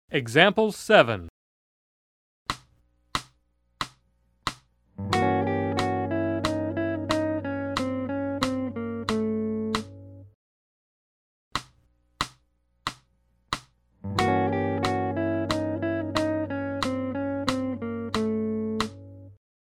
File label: Jazz